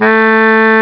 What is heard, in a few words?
m1 tenor sax, Amiga Soundtracker Sample Packs; ST-XX Amiga Soundtracker Sample Packs